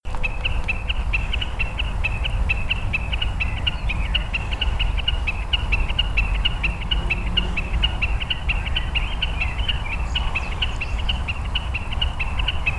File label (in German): Bekassine; Murnau; Christian Haass